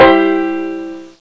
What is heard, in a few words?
banjo, string instruments; Sound Effects for Multimedia - Volume 1